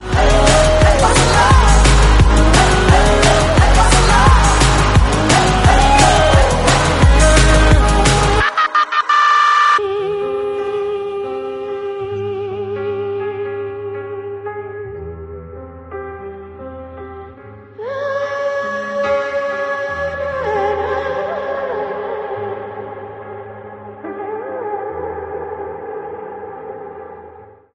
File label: false alarm